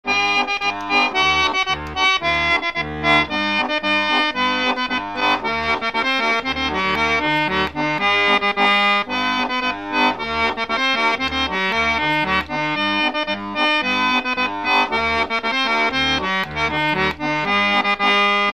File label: goingepolka; folklivsarkivet